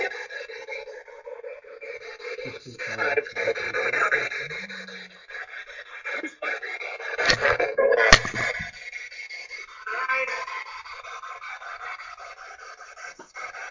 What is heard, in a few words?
Womans Room Spirit Box; wexford general store antique shop return investigation